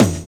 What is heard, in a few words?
ROLL; Univers Sons - Kitch 70s